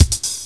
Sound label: dr loop; Descent